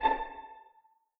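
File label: string pizzicato A4 pianissimo left; Sound On Sound - Peter Siedleczek's Orchestra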